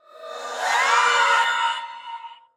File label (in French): Banshee Cri; Phasmophobia